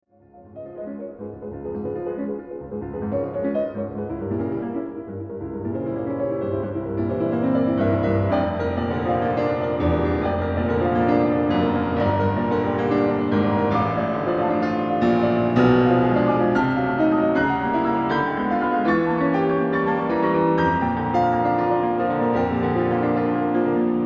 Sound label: Allegro molto